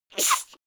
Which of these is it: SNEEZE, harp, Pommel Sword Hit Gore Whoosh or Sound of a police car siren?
SNEEZE